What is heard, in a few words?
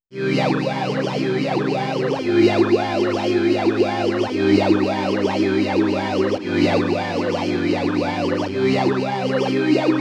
Solaris v5 Formant